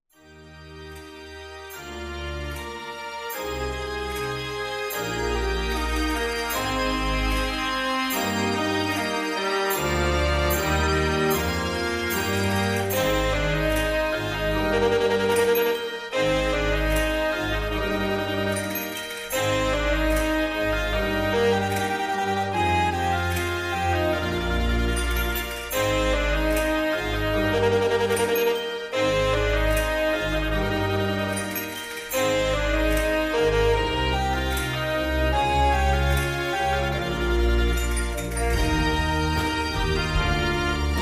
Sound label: 118 key concertorgel; verbeeck; victory on tour